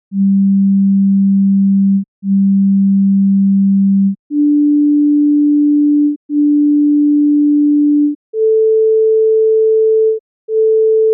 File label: mandolin tones; beginner